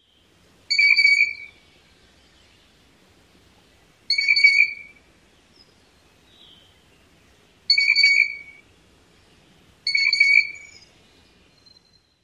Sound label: piping notes; NY